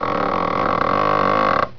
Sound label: squeaky